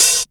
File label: HH OP, HH OPEN; AKAI; USB Soundscan vol.20 - Fresh Disco House I